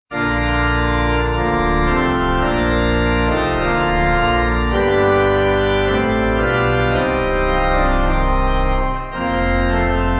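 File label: Pipe Organ, Music Backing Files for Churches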